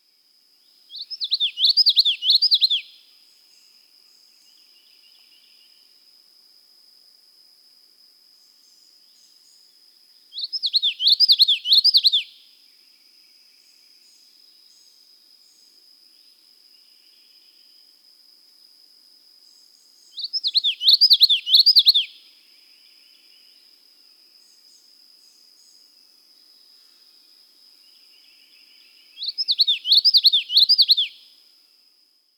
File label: Common Yellowthroat Eastern Song